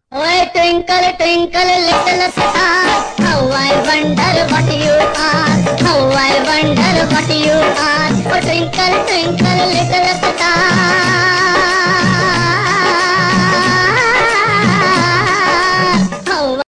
Twinkle Twinkle Little Star Indian version

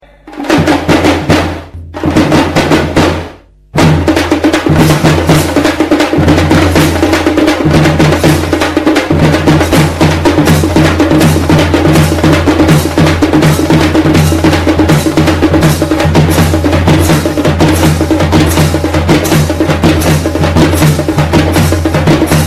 madurai drums; ringtones; rock

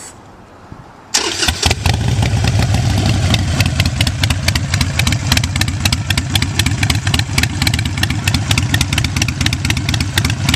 harley 883, ringtones

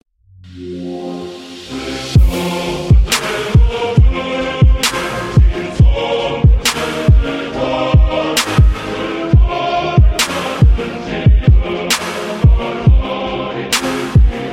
Imnul Romaniei Trap

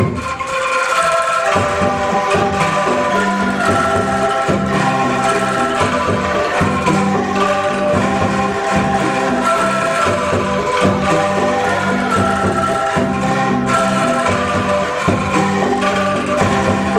angklung

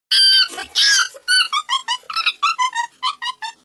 Monkey Sms Tone

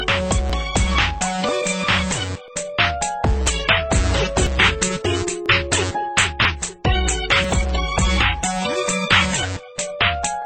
nokia x3 ring

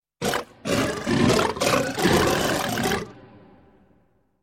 Ding; Southwest

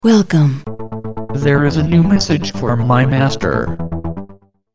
sms tone

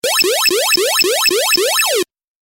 Atari Bowling Strike

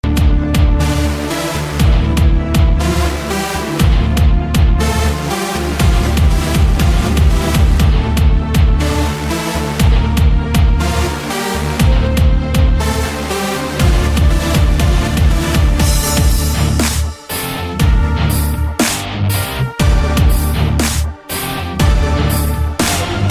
Metalstep

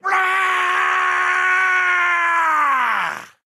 scream; Krieg

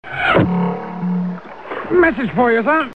arrow message shot

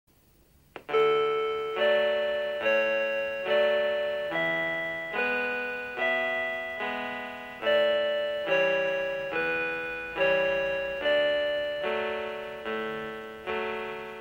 C64

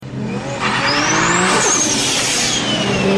turbo supra; ringtones

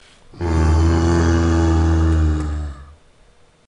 Zombie sound

